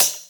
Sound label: cymbals electro